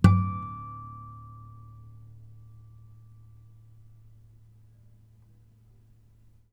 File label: harmonic, strings harmonics